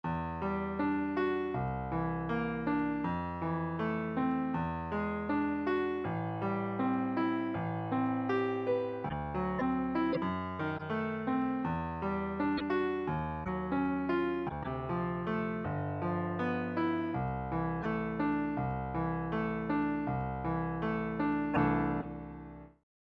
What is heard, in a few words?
tree arp